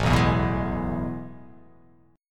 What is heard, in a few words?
A Major 7th Suspended 2nd, chord